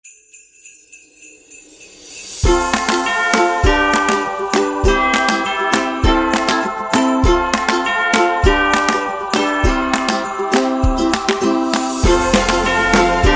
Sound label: Christmas Fun Music